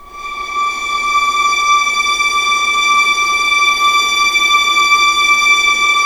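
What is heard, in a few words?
D4 legato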